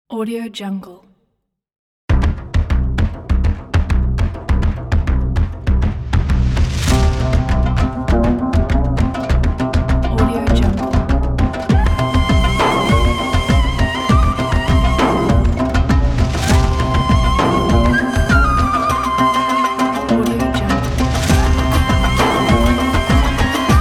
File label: energy middle eastern